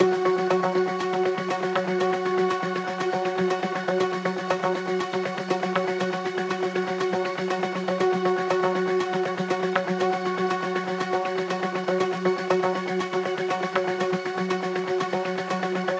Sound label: Tremolo Ambience F 120BPM; sickrate; siik essentials iv